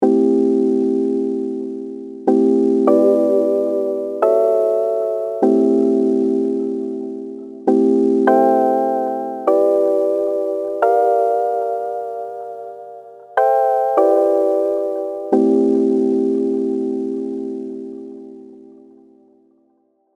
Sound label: TAL S AM6070